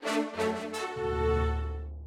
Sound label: fanfare